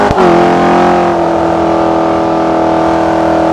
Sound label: fourth cruise, vehicles; 17camaro